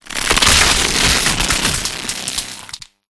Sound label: shockwave tell, mechanical units, substation; Half Life Alyx